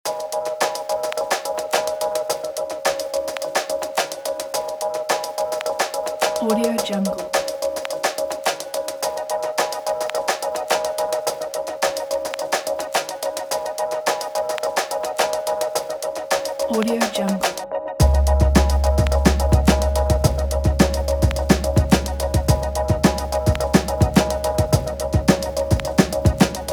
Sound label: triphop podcast